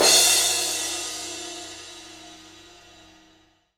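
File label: CYM CRA, cymbal crash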